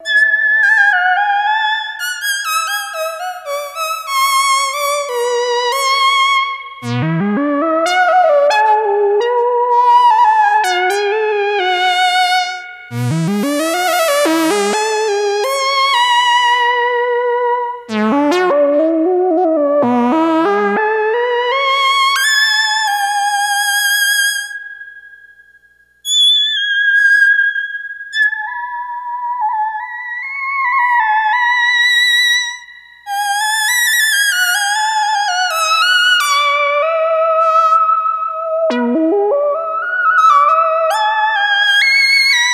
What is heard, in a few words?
vco; Thomas Henry